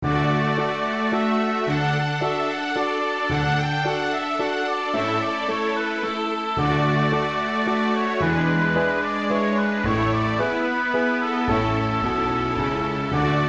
menuet

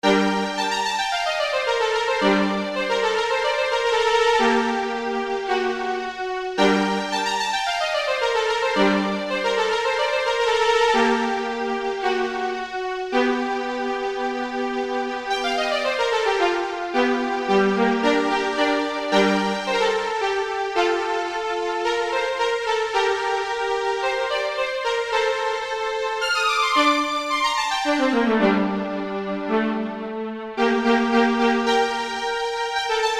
caprice pour violon; chauveau bruno

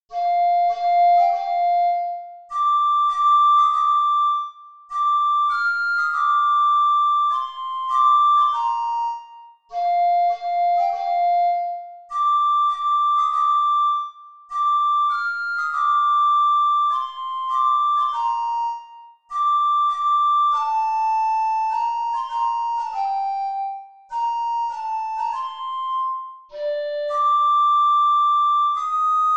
hymne national italien; mameli goffredo

